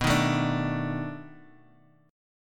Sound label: B 7th Sharp 9th B, Guitar, Drop D